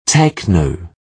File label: techno